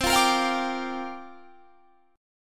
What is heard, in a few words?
C 7th Suspended 2nd Suspended 4th Guitar Standard, C7sus2sus4 chord; C7sus2sus4 strummed